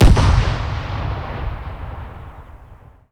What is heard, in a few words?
Explo